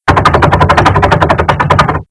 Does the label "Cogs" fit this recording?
Yes